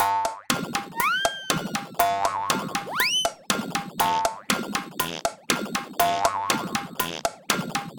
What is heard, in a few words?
cartoon machine clumsy loop